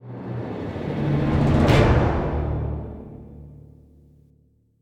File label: Battle Outro; The Witcher 3 Wild Hunt; Toussaint; Dun Tynne